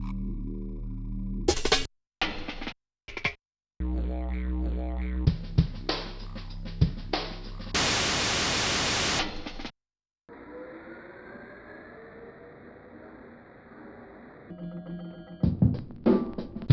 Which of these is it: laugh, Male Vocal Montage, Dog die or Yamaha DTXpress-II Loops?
Yamaha DTXpress-II Loops